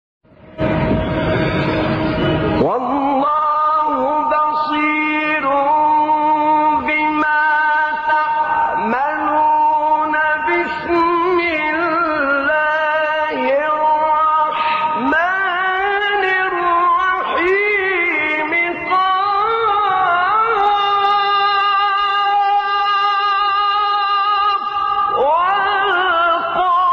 فراز زیبای ابتدای سوره ق; استاد نعینع

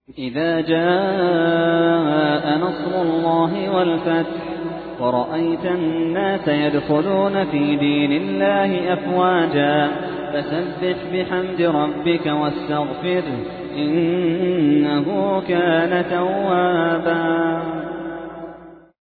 ترتیل; سوره نصر

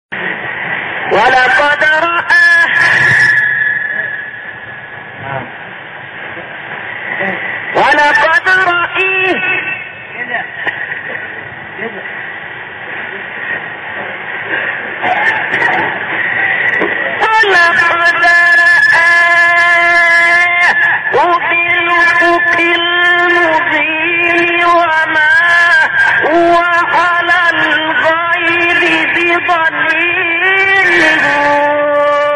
تلاوت آیات سوره تکویر; 23 27; سعید مسلم

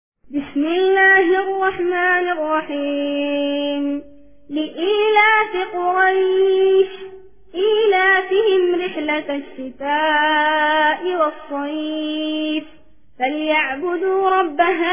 قاری نوجوان یمنی ترتیل; سوره قریش

